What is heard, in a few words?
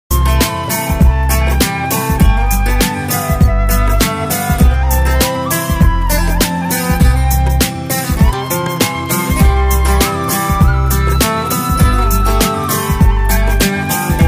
sad ringtone heart touching ringtone